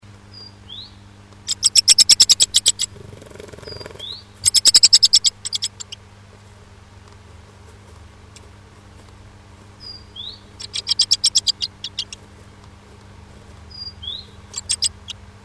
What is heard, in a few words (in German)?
meise m und w, nistkasten